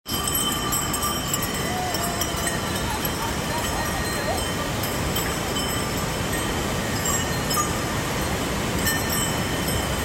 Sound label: Bells and waterfalls on way to Himalaya; Memory bells next to a cascade waterfall in Himalaya, Nepal in April 2025; On route to Annapurna Basecamp which is a 12 day trek; by Tash Walker